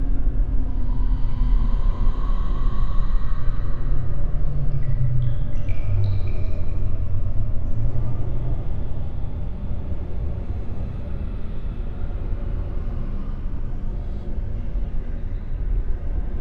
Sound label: Ambient